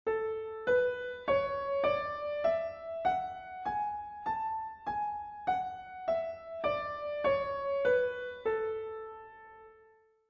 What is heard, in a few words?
A Scale Piano; California Music Conservatory